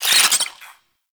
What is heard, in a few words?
Infested Ashen Vocal Pain; Warframe